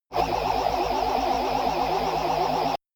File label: shot buzzing wavy tooth low; zaps buzzes